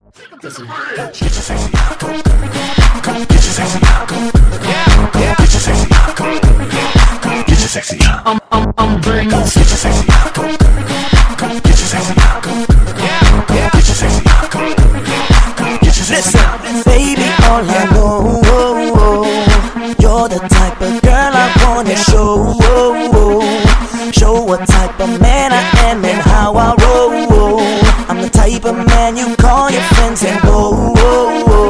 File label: French Mash Up, 115